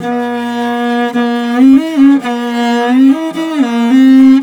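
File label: VIOLI LP, MORIN violins, Asian Traditions; Soundscan vol.60; AKAI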